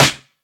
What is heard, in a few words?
airy steel snare drum sound C sharp key